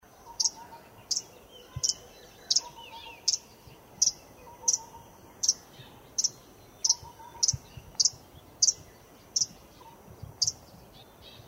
極北柳鶯; 柳鶯科/過境鳥、冬候鳥

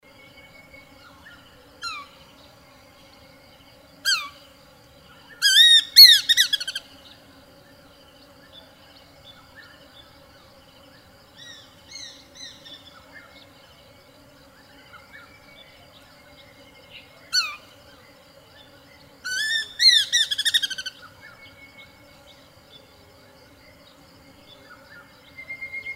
松雀鷹; 台灣松雀鷹; 鷹科/留鳥

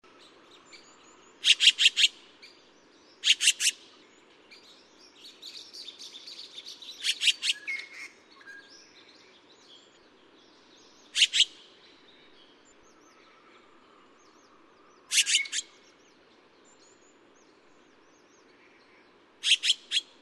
台灣藍鵲; 鴉科/留鳥

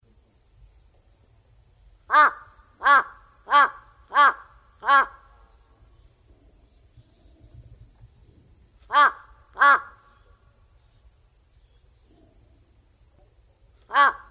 巨嘴鴉; 烏鴉; 鴉科/留鳥

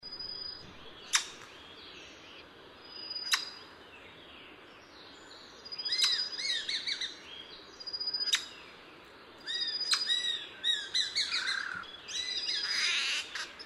松鴉; 橿鳥; 鴉科/留鳥

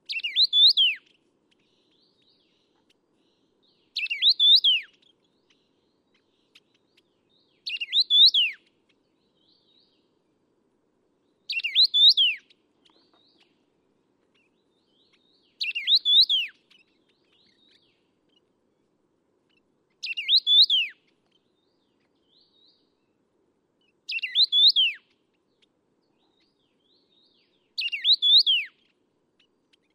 冠羽畫眉; 畫眉科/留鳥

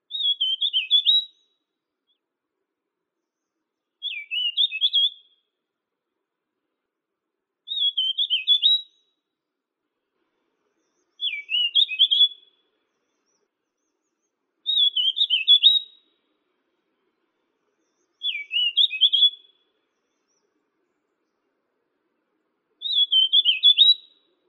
頭烏線; 烏線雀眉、褐頂雀眉; 畫眉科/留鳥

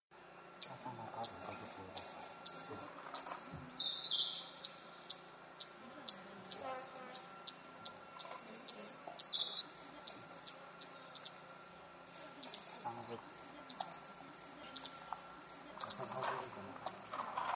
紅胸啄花; 啄花科/留鳥

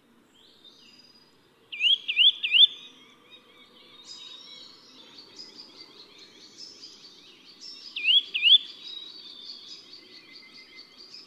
茶腹鳲; 茶腹鳾; 鳾、普通鳾; 鳾科/留鳥

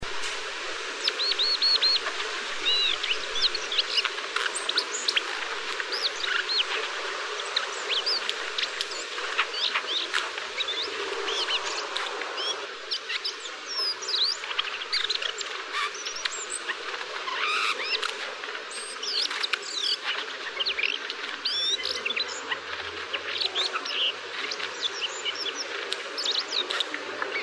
黃尾鴝; 北紅尾鴝; 鶲科/冬候鳥

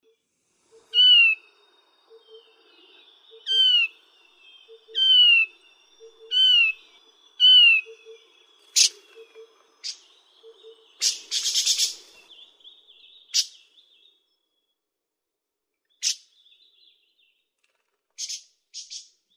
紋翼畫眉; 台灣斑翅眉; 畫眉科/留鳥

